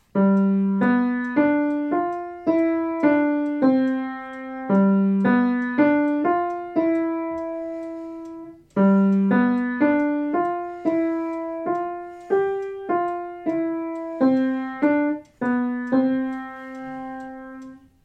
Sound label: elephant ride